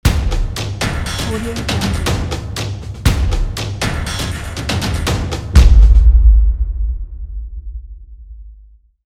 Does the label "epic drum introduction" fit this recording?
Yes